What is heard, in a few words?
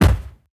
soft impact